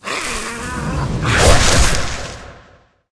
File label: vine attack, monster